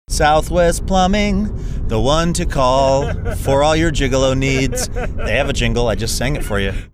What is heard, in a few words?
plumbing jingle; southwest